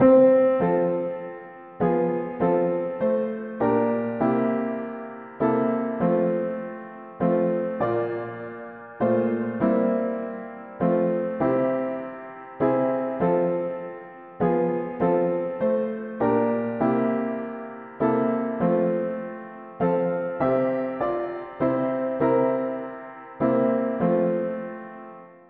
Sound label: mono-piano, hymns